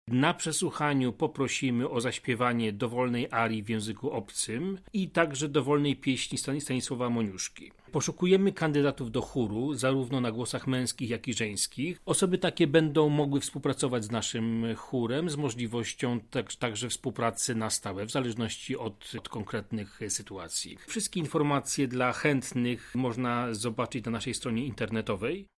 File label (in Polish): Chór; teatr muzyczny; przesluchania choru